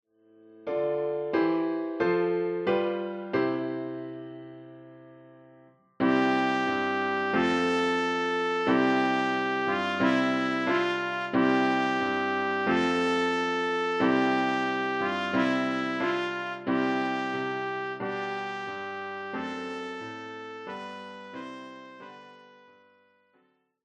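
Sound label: Trumpet; O du fröhliche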